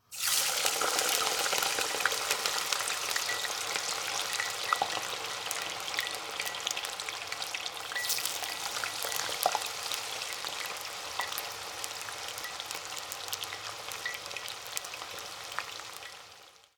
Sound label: cooking; Sonniss GDC